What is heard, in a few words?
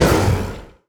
Magma Gladiator hit; IgnisVolcano